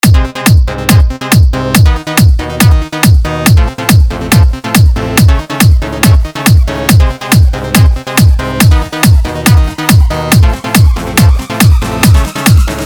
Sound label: Hindi Remix